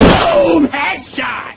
headshot

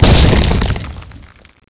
ice crash; Frozen Terror

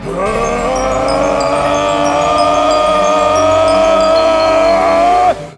power up; db mod; vegeta

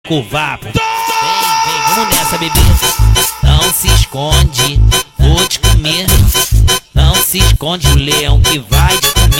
MUSICA; cstrike; zombie plague

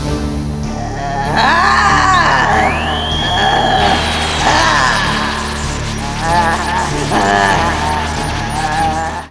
powerup; db mod; frieza

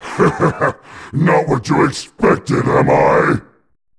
power up; db mod; omega

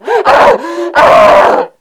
burn; cstrike; zombie plague

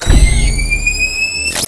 Strider Buster stick; ion canon; cstrike; zombie plague

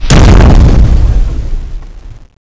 appear end; CSO LightZombie

